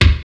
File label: Ensoniq EPS Kick